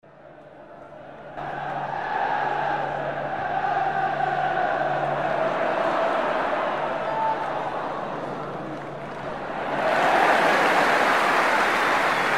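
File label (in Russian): приветствие и бурная реакция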